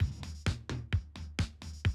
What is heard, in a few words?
130, Classic DrumLoops